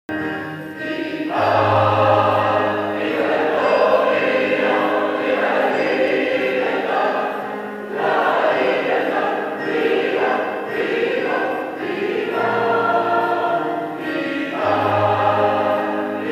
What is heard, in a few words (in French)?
chorale; sainte anne de chatel guyon